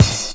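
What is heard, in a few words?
cymbal, Drum & Bass, 160 BPM; Ueberschall; Drum & Bass Flash Trackz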